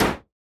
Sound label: meta hit normal; CrossCode; battle